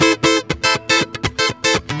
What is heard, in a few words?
GUITAR SALSA